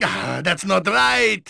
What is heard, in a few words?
hit; NWNDS